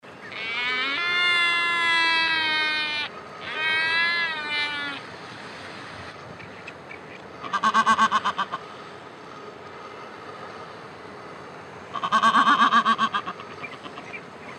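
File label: black-browed albatross voice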